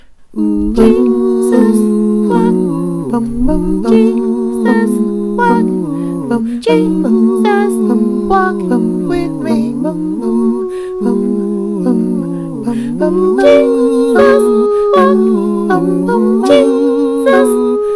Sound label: TUTTI